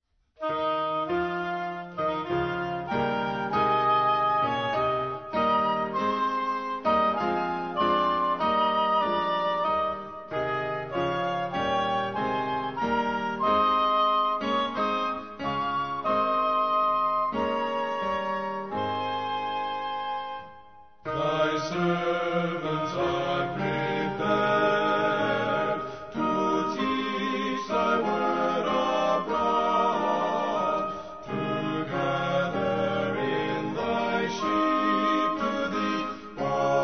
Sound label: Mens Choir Thy Servants Are Prepared; Hymns